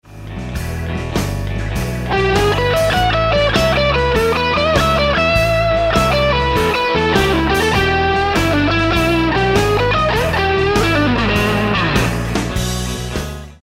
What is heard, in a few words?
Lick, guitar